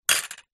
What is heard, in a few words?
glass shard from broken picture frame drop not break; foley